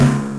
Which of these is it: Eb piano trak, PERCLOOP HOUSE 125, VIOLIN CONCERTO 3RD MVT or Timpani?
Timpani